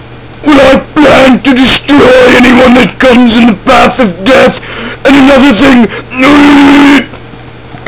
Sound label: death